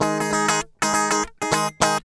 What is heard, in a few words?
GUI, 100 BPM